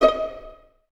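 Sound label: violins D#5 pizzicato, String Ensembles; Miroslav Vitous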